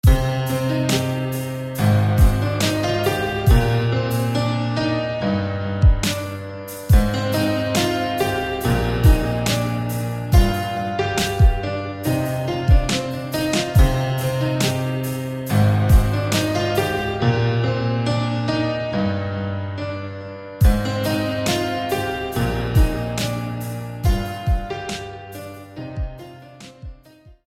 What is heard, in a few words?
Piano; Brazenwood; SFB FX